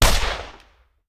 Hand Gun; Sound Ideas; Guns

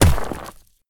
blast Rock; Apotheorasis

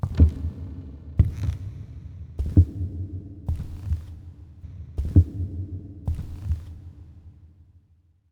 Footsteps Wood Reverb; Inquisitor 3

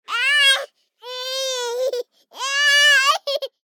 BABIES LAUGH Boy 12 months Giggeling Dynamic; Baby Boom

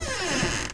Door Wood Creak; Doors

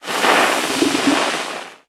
Poison; Kilta

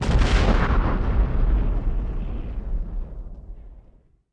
Explosion For Background; blindside

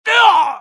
male pain hard; firefight

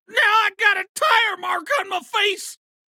hit reaction; abe; Blind Drive

